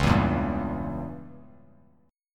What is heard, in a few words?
C sharp Diminished 7th C sharp down Bass Standard; C#dim7 strummed; JGuitar